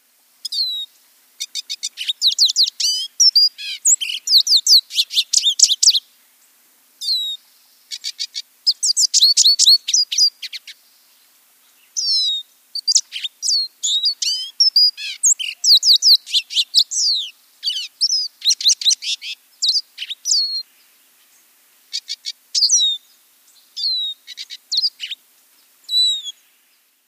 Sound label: Lesser Goldfinch